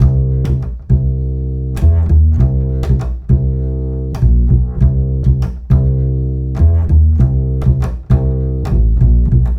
Acoustic Bass

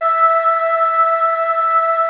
SYNTHVOICES E4; Music Store - Claudius Diemeter Schwerlos

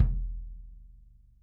kick

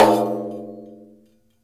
DAIRES OPEN, Mediteranean Traditions percs

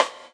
Gate Rim, Drums Acoustic; USB Soundscan vol.10; AKAI; 90s sample CD

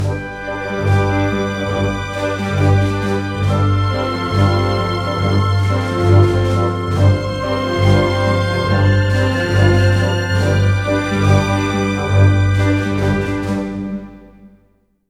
BOLE, ORC Bolero; East West - Scoring Tools

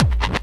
Kik Scratch; Multisamples; Mo' Phatt; Digital Sound Factory Mo' Phatt

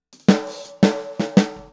Boogaloo - Snare, 82 BPM; Drums; Intro; Sonic Foundry; Drums from the Big Room The Mixes

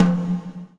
GATE TOM, Drums Acoustic; USB Soundscan vol.10; AKAI; 90s sample CD; GATEKIT 2

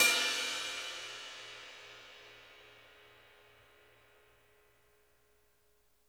bell, drum kits; Sonic Reality

